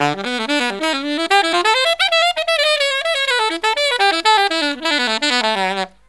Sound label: SOLO SAX; USB Soundscan vol.29 - Killer Brass Riffs; AKAI